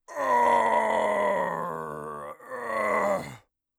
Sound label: death, orc voice; Orc Voice Pack